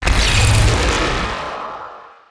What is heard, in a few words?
sunder cast; terrorblade